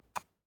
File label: DICE on Wooden Tray, Grab, Standard, 1 One Die; Samples; ArticulatedSounds; DICE Sfx Party (Extended)